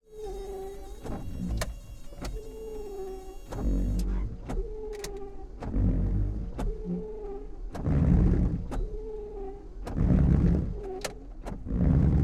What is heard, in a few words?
zil garbage truck interior wipers; fvtt sonniss gdc audio